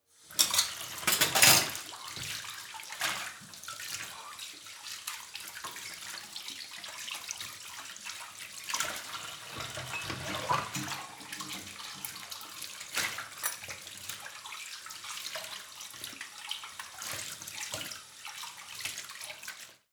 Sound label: Rinsing Dishes